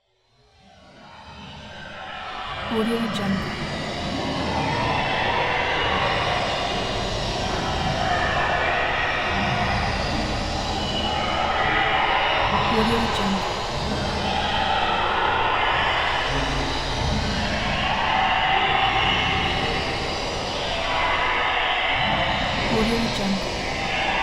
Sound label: alien game ambiance glitchy sci fi soundscapes mothership; mothership machinery